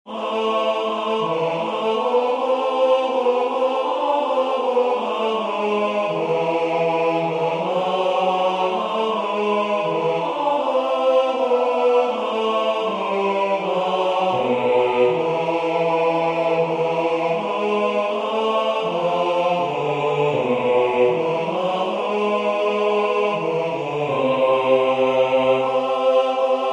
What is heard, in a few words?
Shalom aleichem Bas